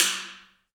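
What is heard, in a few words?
Kenyan Drum, African hand drum; Roland LCDP14 Africa VOL-2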